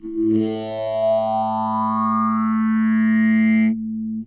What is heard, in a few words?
Guitar Textures